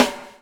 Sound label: BUBL, BUBBLE; Spectrasonics - Backbeat